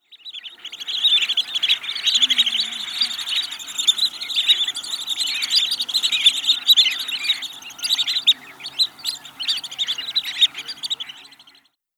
Limnodromus scolopaceus, water birds; Chordata

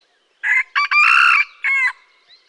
Gallus gallus domesticus; Chordata; LandBirds

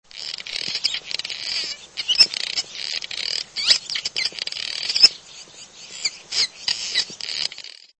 Petrochelidon pyrrhonota; Chordata; LandBirds

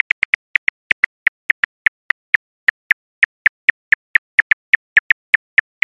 Eptesicus fuscus, Mammalia; Chordata

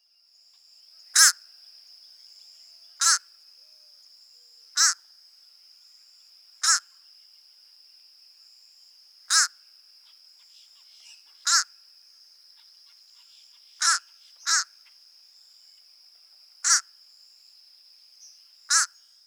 Corvus ossifragus; Chordata; LandBirds

